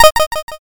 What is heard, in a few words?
cancel